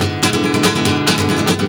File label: GUIT GROOVE; Best Service; World Colours